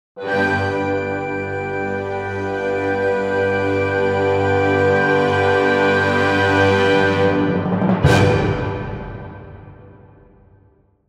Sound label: swelling orchestra end